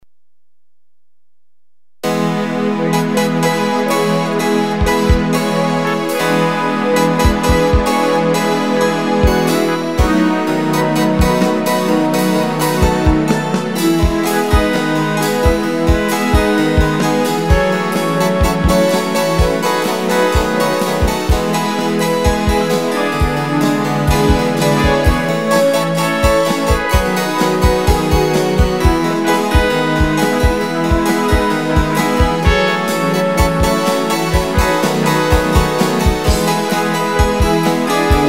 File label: orch